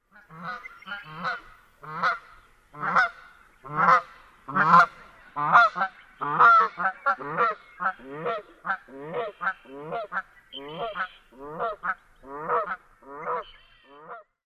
pair flying overhead

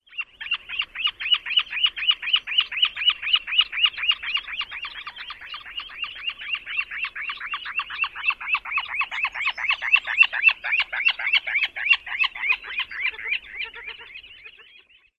flight calls; AK

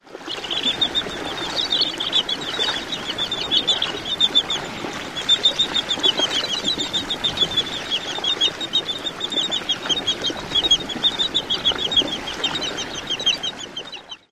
calls

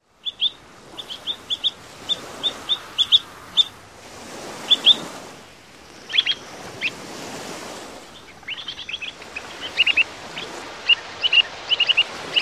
foraging calls

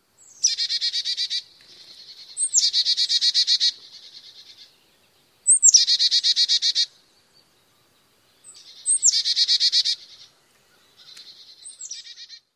calls

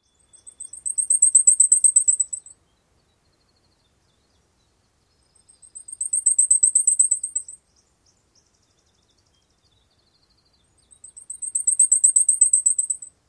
songs

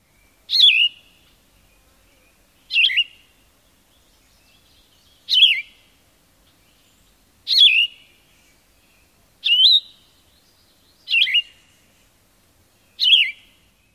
song

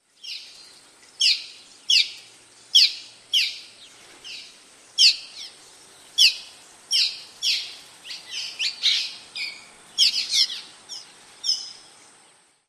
calls

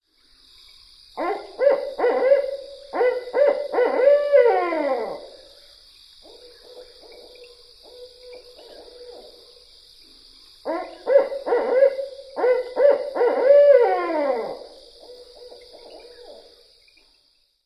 classic hoots

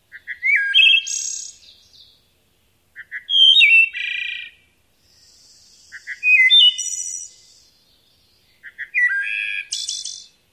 song

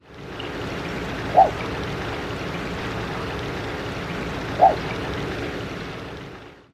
two soft croaks

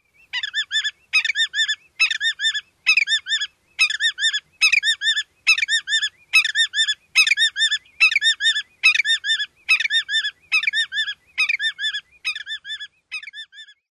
song

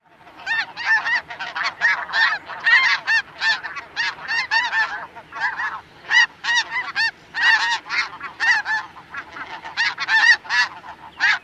small flock flying over

